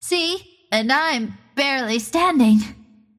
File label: deny pain; Dota 2; Windrunner